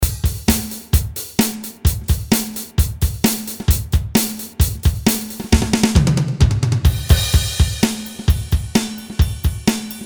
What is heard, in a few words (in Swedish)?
Pop Trummor, mobilsignaler